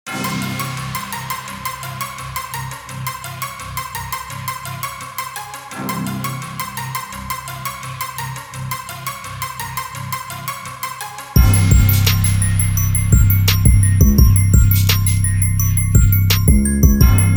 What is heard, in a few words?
85 Bpm